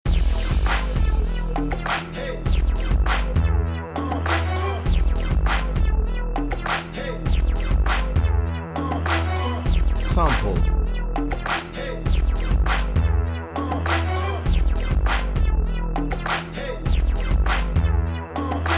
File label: hiphop